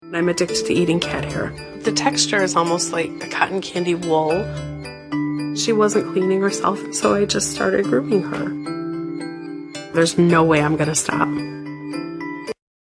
eating cat hair

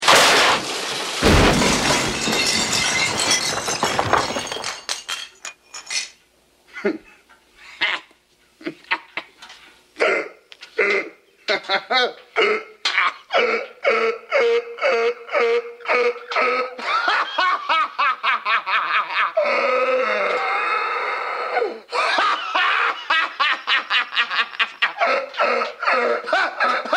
laugh; money pit

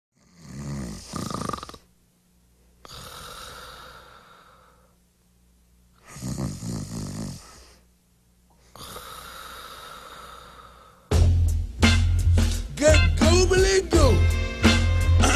Rap

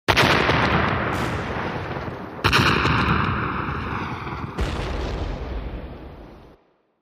explosion; opening

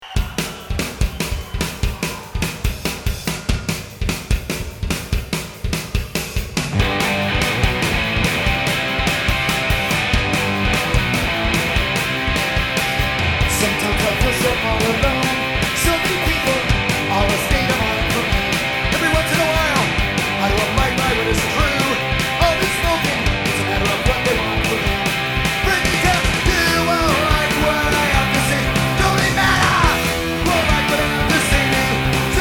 The Crowd

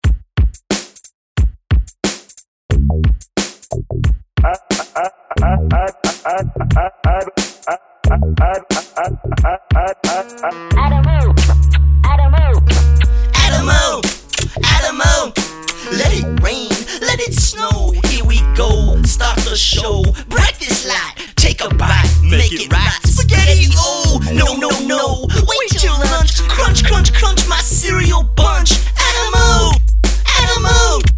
Theme Song + Vocals

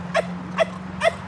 Laugh

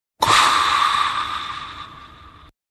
hatch opens; opening

